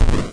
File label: vanish; eliasdb